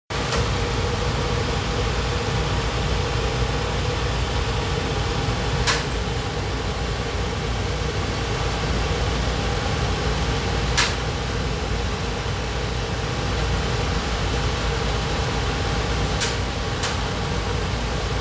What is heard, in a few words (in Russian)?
zvuk generatora cherez dver